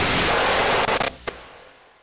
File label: jumpscare; rush; roblox doors